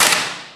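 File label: physics clipboard impact medium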